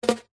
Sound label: card long hollow tube grab or catch hand